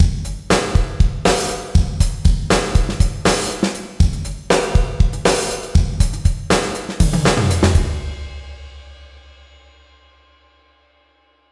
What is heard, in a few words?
RaumMaschine Drums Medium Club; Tegeler Audio